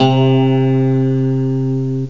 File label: record guitar